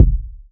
urban kickdrum sound D# key; kick drum samples; D sharp key

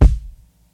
tight low end kick sound g sharp key

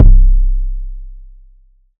long bass drum single hit C key

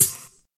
dark tone hihat sample c sharp key; one shots; hat samples

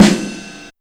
low acoustic snare sound g sharp key; Mono; snare drum

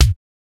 reverb kick drum single hit g key

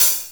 dry pedal hi hat sound f sharp key; drum single hits one shots; hat samples

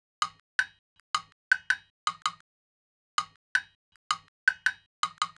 jam block studio sample

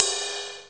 short reverb ride single hit a sharp key

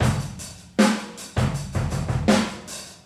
79 bpm classic breakbeat b key; drum loops

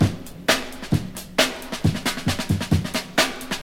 133 bpm hq drum beat g key; drum loop

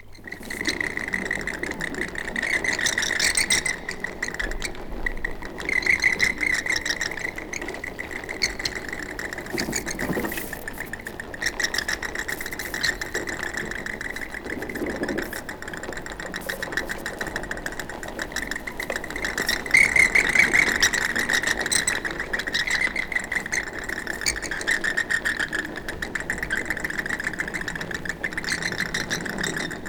bats calling; horror

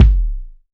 puffy bass drum sound d key

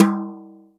hq high tom drum c key

